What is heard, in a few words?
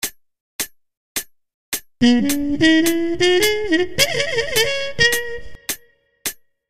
shake